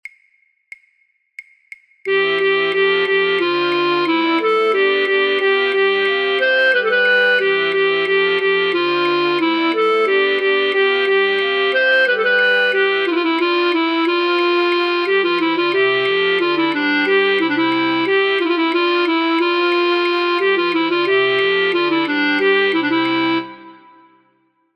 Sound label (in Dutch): Branle flute choir voor oefentrack fluit; Rameau; Platee